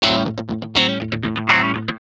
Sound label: GUI, LICKS; 120 LICKS